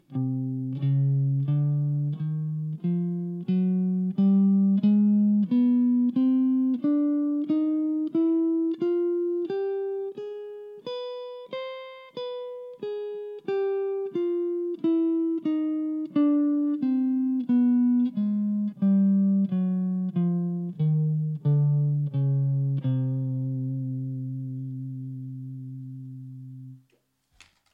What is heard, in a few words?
Harmonic Chrom